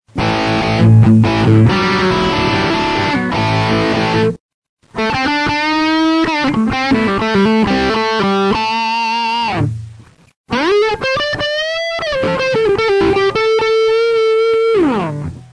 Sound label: Music Distortion